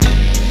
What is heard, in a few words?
HIT, Hits & Brass